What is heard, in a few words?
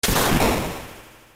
bolt; ff3; ff3us